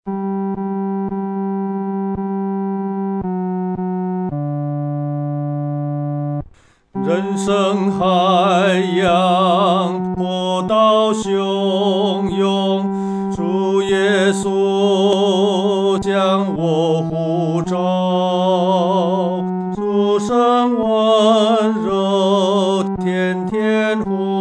独唱 第三声

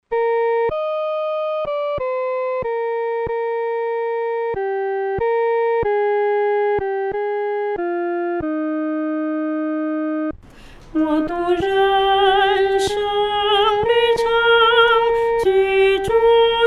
独唱 第一声

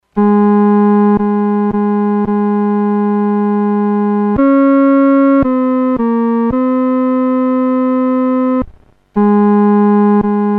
独奏 第三声; More Love to Thee; Elizabeth P. Prentiss

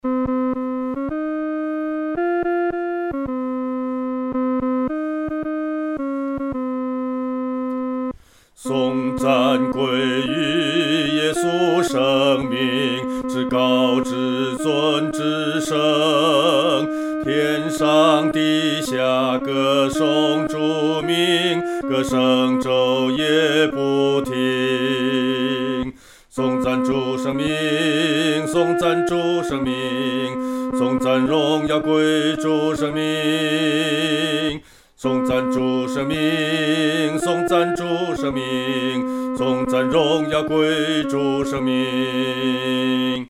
独唱 第二声; 颂赞主圣名

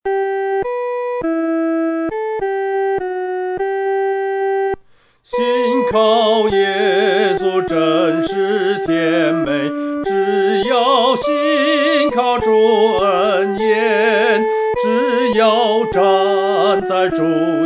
独唱 第一声; 信靠耶稣真是甜美

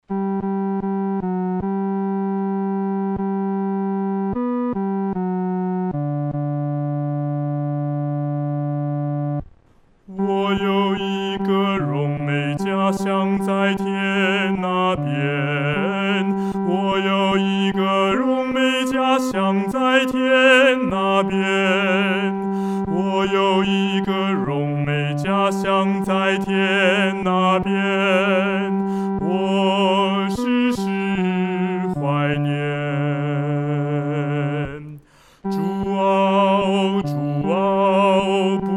独唱 第三声

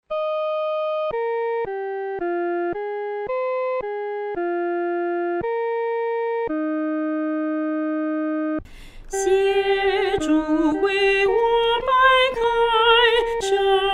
独唱（第一声）

